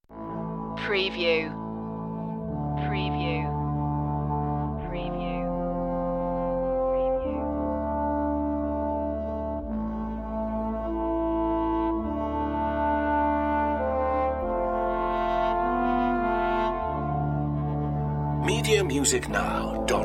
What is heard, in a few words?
brass; orchestral soundtrack; epic themes